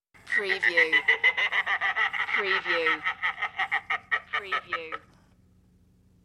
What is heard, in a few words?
Evil Laugh